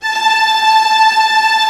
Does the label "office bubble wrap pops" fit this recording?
No